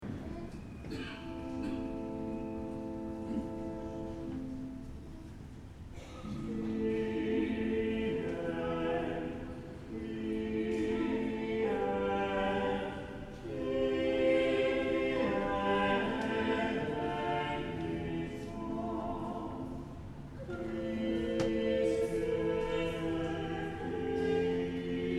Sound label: Choir sings Kyrie.